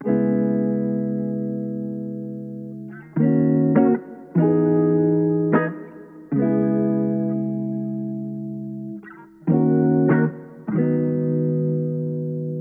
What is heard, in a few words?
152 BPM B Maj Guitar, loop stems; Cymatics; 2022 Melody Collection Platinum Expansion - Pt 1; Forever